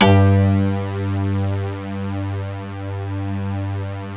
PAD PAD; Sound & Vision; Gigapack I CD 2; PAD SYNTH-PADs 1

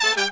100, BRASS SAMBA

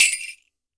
MIXED PERC; 90 s Sample CDs

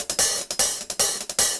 150, Top Ends, Beats; Zero-G; Monster Beats

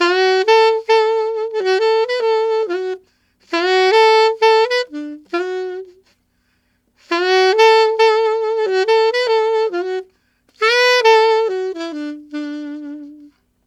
Alto County in D maj, Sax; Sonic Foundry (Sony Creative Software)

